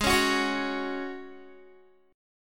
Ab 7th Suspended 4th Ab down Guitar

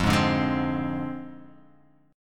F Minor 6th F down Guitar Half Step Down

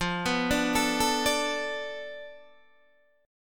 F 6th Flat 5th F down Guitar DADGAD, chord

